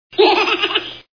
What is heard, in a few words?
MAD GIGL